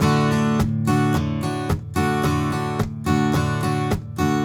RIFF, Blues Guitar; ILIO; Fretworks

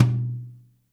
TOM; Zero-G; Chemical Beats

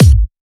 Trance Kicks, Bassdrums

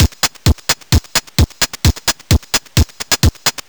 Crushed Beat 130BPM; musicradar

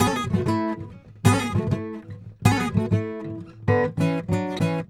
Traditional Guitar 098bpm A, African Guitars; Quazibeat - African Secret

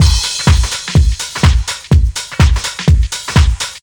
sunsplash, Deep House 125bpm

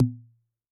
Tom, Yamaha DTXpress II

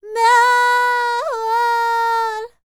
Vocal Planet World Voices, NOMADIC

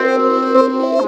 philter (suspender); Sonic Foundry (Sony Creative Software); Ugly Remnants VOL-2

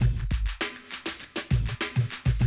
drum loop, 100 BPM

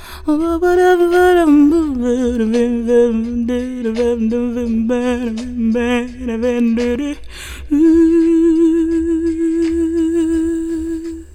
FEMALE VOX, Hip Hop

